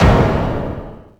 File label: badum